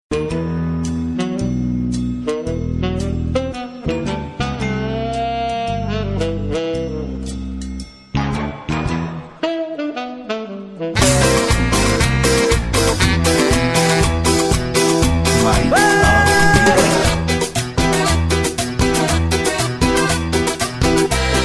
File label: Cumbia